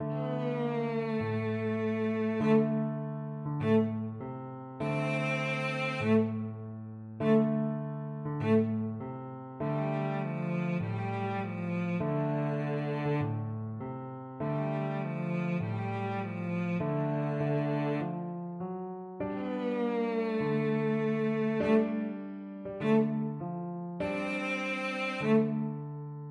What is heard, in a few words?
rock, cello